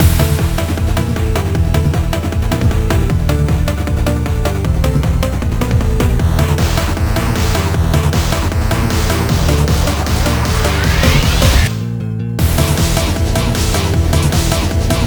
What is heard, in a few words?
Instrumental